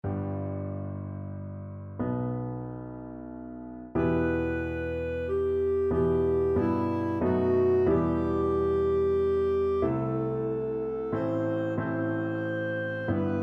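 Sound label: piano sonata clarinet; beethoven 29 Op 106 3rd mvt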